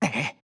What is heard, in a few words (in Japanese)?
攻撃